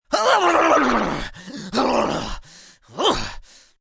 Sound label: Grunt Horselike, Grunts, groans, lifting, strain, male, straining with head shake; (human voice); Groaning